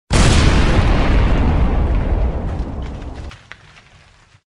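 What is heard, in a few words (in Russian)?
vzryv granaty kuski zemli, grenade explosion chunks of earth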